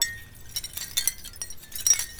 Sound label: Rythymic Twinkle Loop